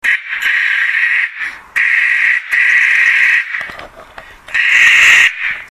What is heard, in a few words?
jay call